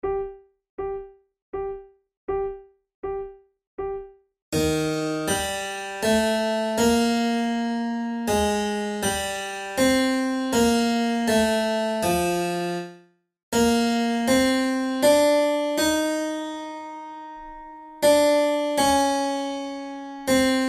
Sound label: adagio, flute